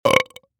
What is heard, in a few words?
burp; garrysmod; food